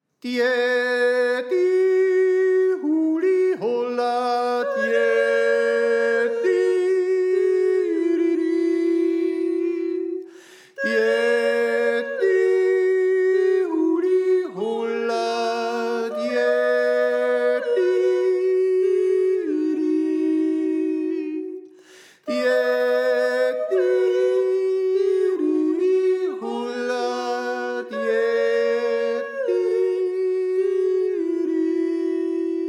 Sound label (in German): Tenor; Liabnstoaner